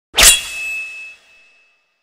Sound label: Katana; کاتانا